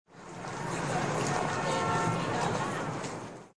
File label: Rush Hour; CityBackgrounds